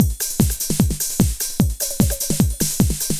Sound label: LOOP, Eurotech; Sounds Good